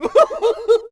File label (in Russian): smeh